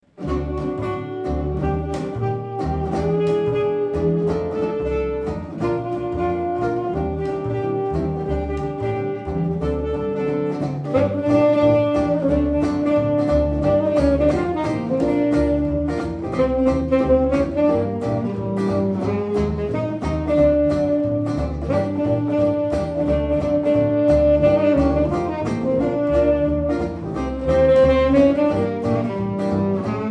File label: Swing Band Douce Ambiance